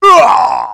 MALE DEATH; combatarms

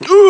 pain; terror

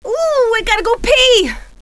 pain, female; kingpin; blunt

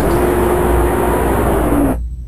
door ship; dn3d r

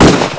gun

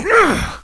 grunt; kingpin

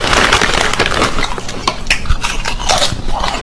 chips

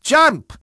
jump

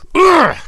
HURT PAIN MALE; combatarms

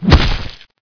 claw strike; justislave

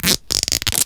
scotch tape

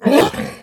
zombie pain

